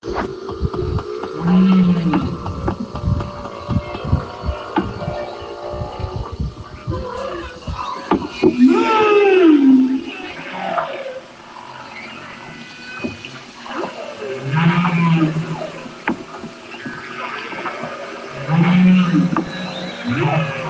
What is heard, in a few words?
whale sounds; intense interactions